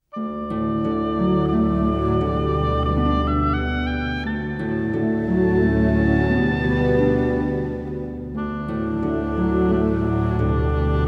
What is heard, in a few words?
A Gaelic Blessing; Guiding Light